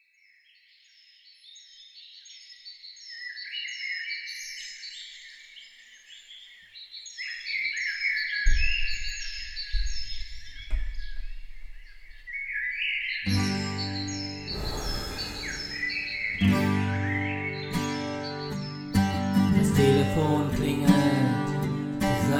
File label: Mamma acoustic